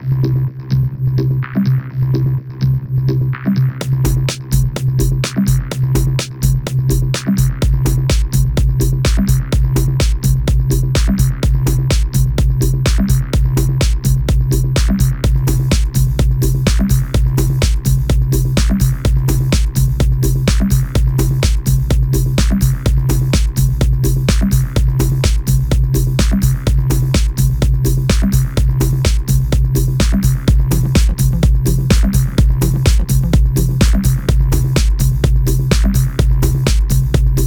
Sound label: Double beat punk